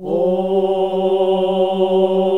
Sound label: "Ooh" G1, classic vox